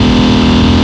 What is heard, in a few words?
CAR; NFS3 Cars